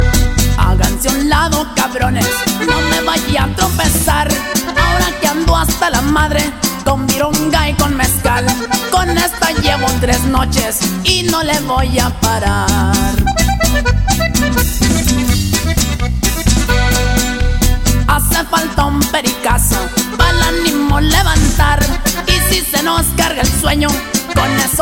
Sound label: narco corridos, ringtone